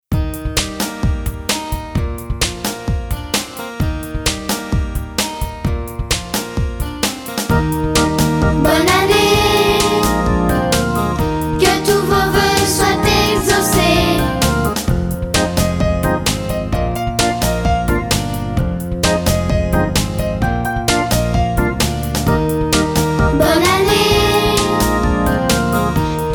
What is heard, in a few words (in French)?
VERSION KARAOKE